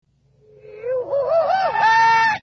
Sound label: goofy yell backwards; goofy yell reversed